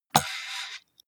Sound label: computer mouse draw drop hard